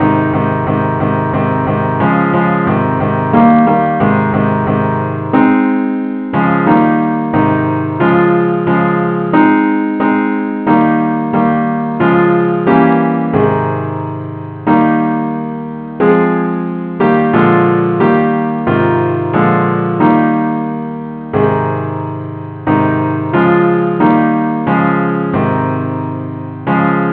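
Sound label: simple accompaniment, key a flat; christe Sanctorum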